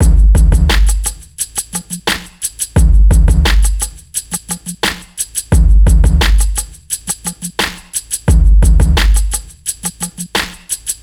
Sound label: Hip Hop Beat, Drums